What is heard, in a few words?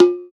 Zir, Percussion; Roland BK-5